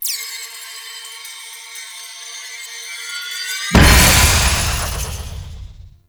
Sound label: Despawn; Warframe; Duviri; Dax